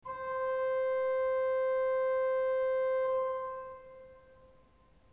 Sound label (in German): ton, orgel